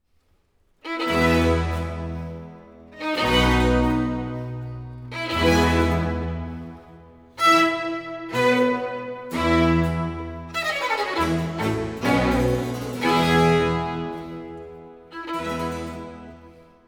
Violin concerto in F major, Largo molto e spiccato; Vivaldi; I Concerti dell'Addio; Fabio Biondi; Europa Galante